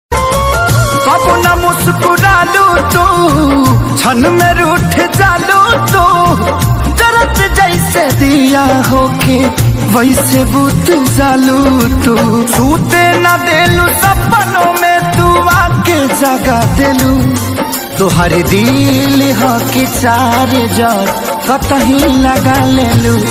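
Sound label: BHOJPURI RINGTONE sad RINGTONE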